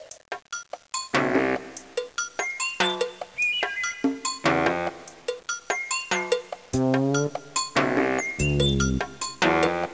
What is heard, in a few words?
Time Termite Game Song; Let's Discover Massachusetts